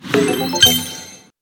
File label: hammer lootbox; discord dream